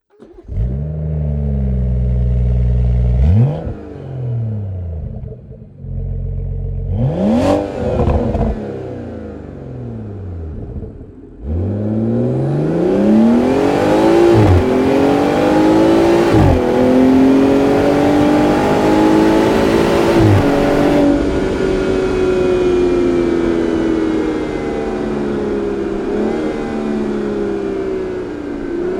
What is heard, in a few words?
5er M Performance Parts Exhaust System